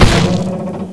hit; svencoop; AoMDC; monsters; wheelchair

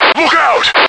chase; slender; chaosinsugunner

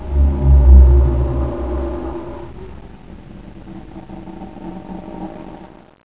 die, monsters, lurker; svencoop; AoMClassic

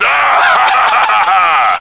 kill player; slender; chaosinsugunner

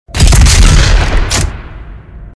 shotgun; slender; marauder

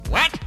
backstab; tf2; freak fortress 2; new spookmaster

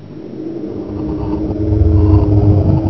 die, monsters; svencoop; AoMClassic; face

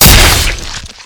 hit; slender; marauder

